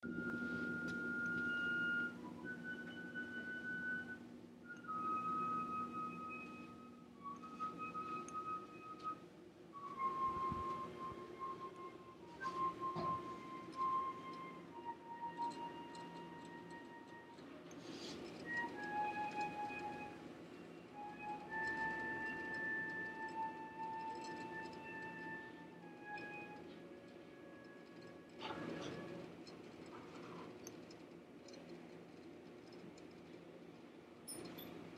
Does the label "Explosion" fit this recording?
No